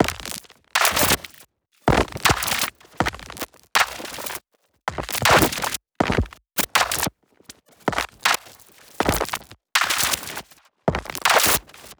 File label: perc crispy grain claps looped; Polyend; Recue; percs glitches and layers